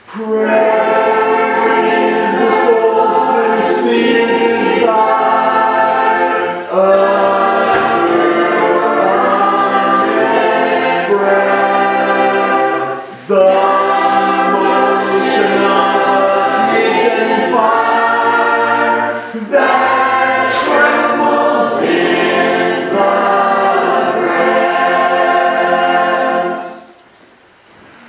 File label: singing class, hymns; prayer is the soul's sincere desire